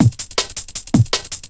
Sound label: 160bpm, breakbeats